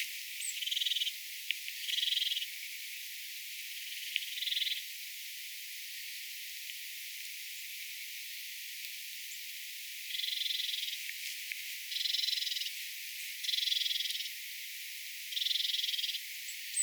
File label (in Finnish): tuolla tavoin aanteleva ilmeinen sinitiainen